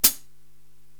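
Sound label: Hi Hat Foot; Korg TR-Rack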